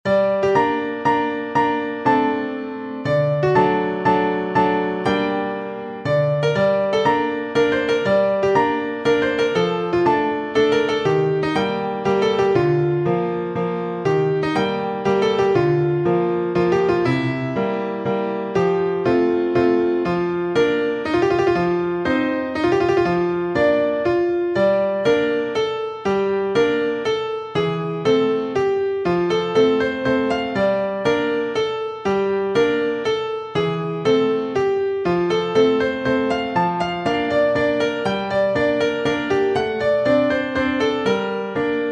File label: Piano